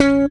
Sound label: PULL C#4, Bass; Sampleheads; Will Lee Bass Library; SPECTOR PULL